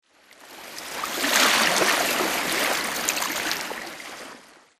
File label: swampland water lapping; Titanfall 2